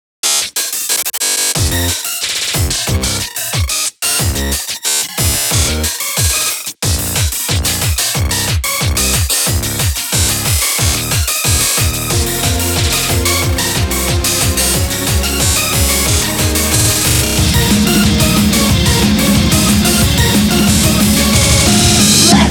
Instrumental

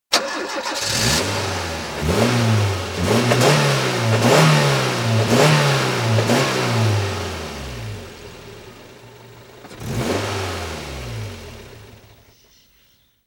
Ignition Exhaust Sound

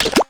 Start Button Press; The Jackbox Party Pack 2 - RU

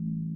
Windows Ringout; GMM Windows 10

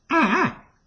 ostrich; Indev+ 0.3 Portable

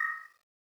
Windows Feed Discovered; Glass

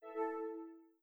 Speech Sleep, Windows 10; GMM Windows 10

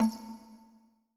Windows Ding; Pearl

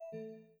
Windows Hardware Remove; GMM Windows 10

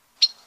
bird blue, mob; Indev+ 0.3 Portable

